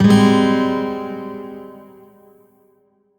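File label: Shawzin Minor Chord; Warframe; Lotus Shawzin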